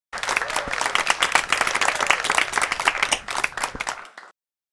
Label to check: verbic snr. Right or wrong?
Wrong